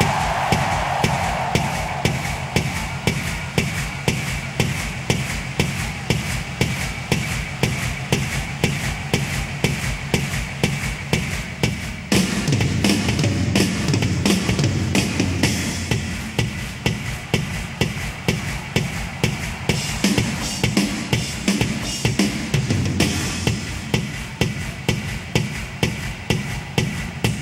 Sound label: bonus game ambience; netent